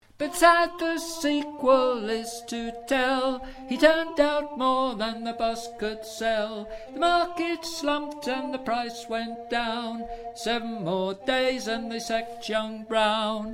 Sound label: Alto Voice